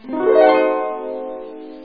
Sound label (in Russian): arfa zagadochnoe arpedzhio